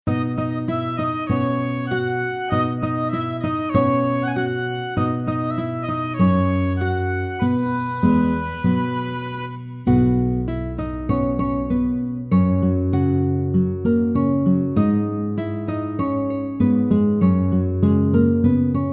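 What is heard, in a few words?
instrumental; spanish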